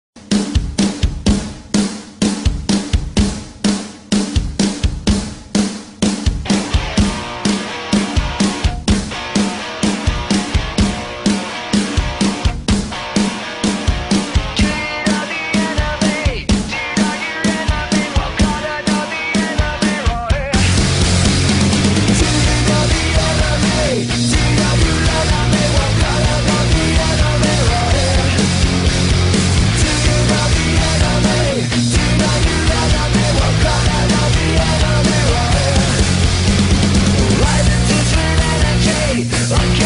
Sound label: Theme